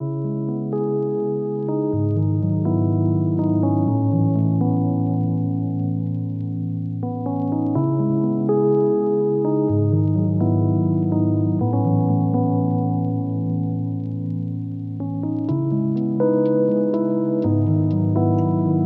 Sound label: lofi hip hop